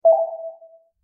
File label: message received